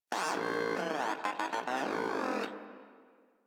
Moa Aggressive Vocal Longing; Warframe

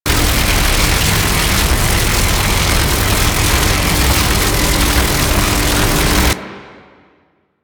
Turret Beam Loop; Warframe; Duviri; Duviri Turrets

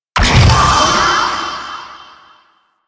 Wraith Pre Death; Enemies; Warframe; Zariman

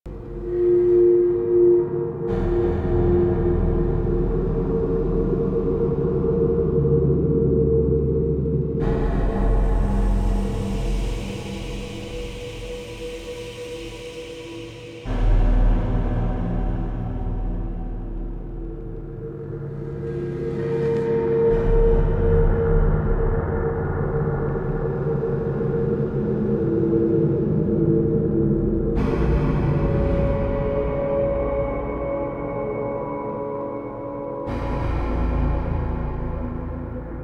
Interior Large Lab Bed Loop; Warframe; Ambience; Entrati

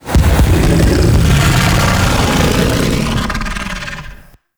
Pit Monster Acid Spit; Warframe; Infested

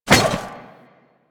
Zipline Brake Foley Contact; Gadgets; Parkour; Warframe

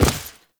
Step Default; Enemies; Foley; Footsteps; Warframe; Duviri; Dax

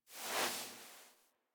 Foley Idle Stand; Enemies; Kubrow; Warframe

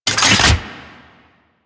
Secondary Mech, Weapons; Kuva Hek; Warframe

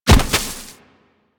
Bird Of Prey Bodyfall Grass; Warframe; Hunting Critters

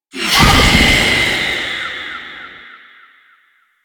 Wraith Possess; Enemies; Warframe; Zariman

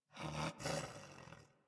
Pet Snore; Enemies; Warframe; Catbrow; PetShip

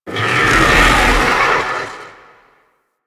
Pelt Move; Warframe; Warframes; Dragon; Augments

